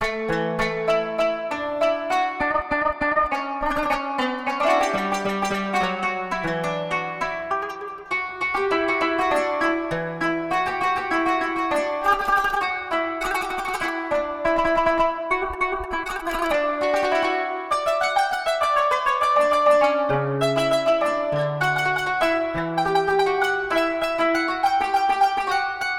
qanun turkisch saiz; basam ayoub qanun

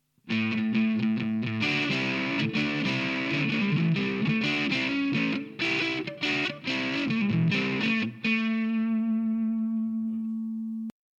Les Paul blues lick neck position; koenig blue note gitarren top; Harald König

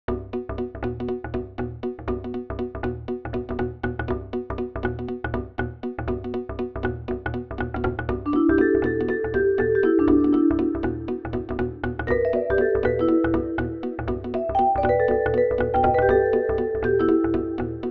vibraphone; Arturia V Collection 11

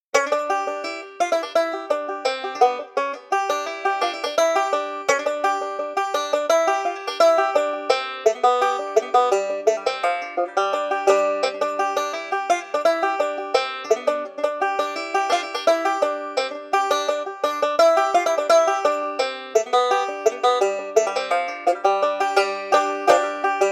banjo

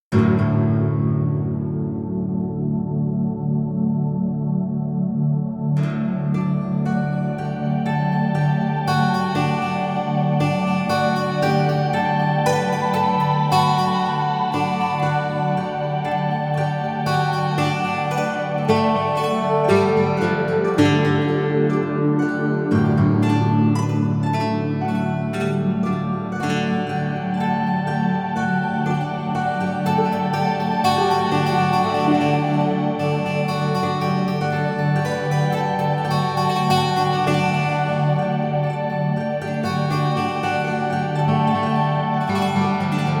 celtic era

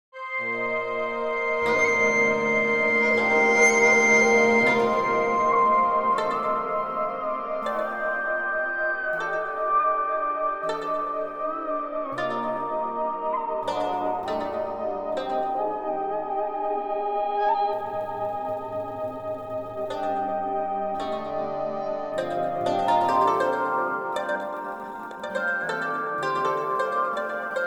orchestra; yangze; Arturia V Collection 11

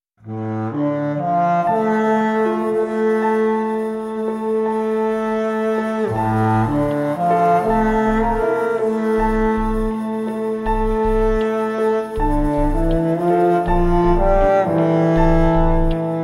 winds dual motion; chris hein orchestral winds und brass compact

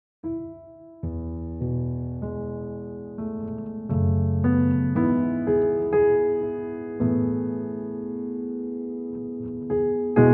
rosewood grand; Rosewood Grand Piano von Orange Tree Samples

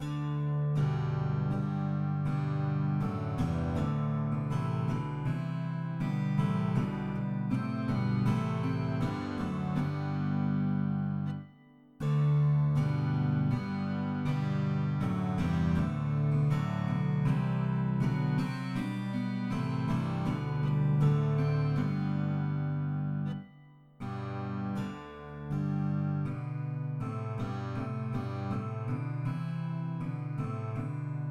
Harp Guitar; Brad Hoyt's Harp Guitar; Soundiron

